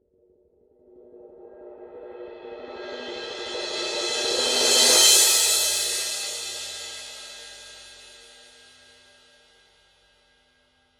18 full crash swell; Forgées à partir d’un alliage secret de bronze; signature; paiste

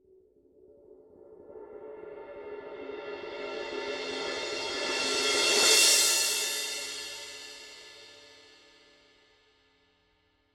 16 fast crash roll; Forgées à partir d’un alliage secret de bronze; signature; paiste

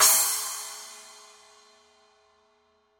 10 splash edge; signature precision

